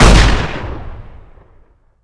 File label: lever action shotgun